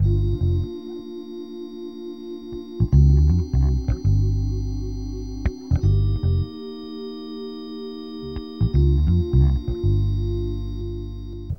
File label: bass, Loops, Phonky